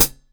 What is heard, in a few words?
CHAT FOOT, CYMBAL